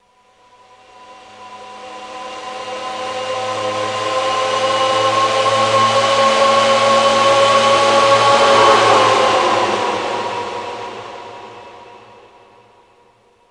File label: Torpedo Jet By, torpedos